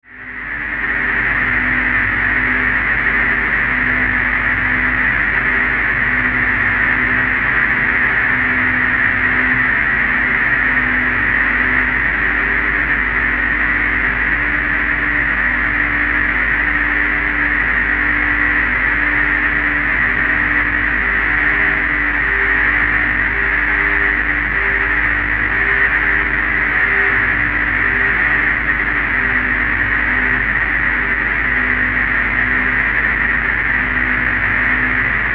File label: Drone Dark Flange Filter Buzz Swell; Blastwave